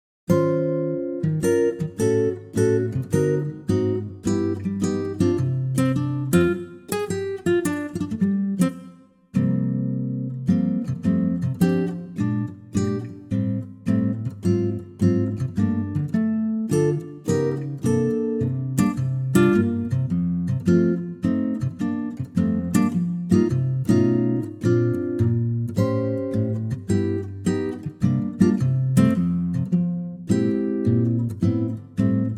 ac gtr F; key - F - vocal range - C to F

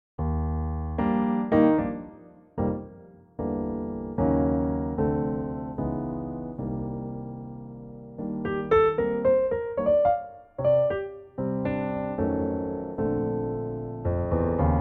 Bb piano; Pianotrak; key - Bb - vocal range - E to A; Gorgeous piano only arrangement, at a nice tempo, and perfect for a cool vocal.

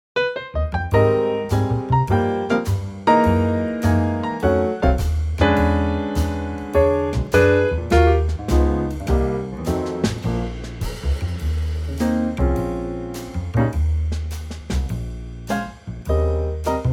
Ab Trio; vocal range - Eb to G (optional Ab top note); Super cool Trio arrangement of this Disney classic from "Lady and the Tramp"

